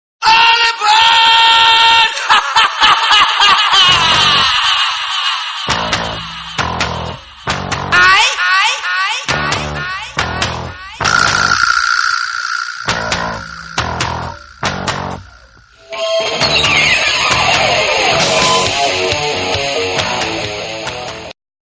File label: fluffy laugh